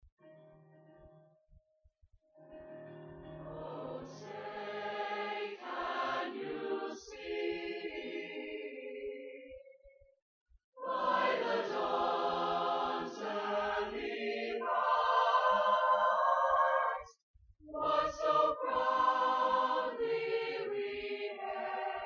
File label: Chorus Star Spangled Banner